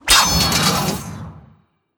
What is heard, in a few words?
1H Sword Magical Underlay Death